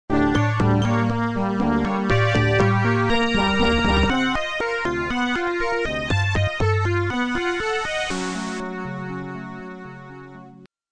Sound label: music Intro AdLib; Heretic